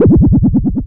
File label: Wibble, Synth FX, cartoon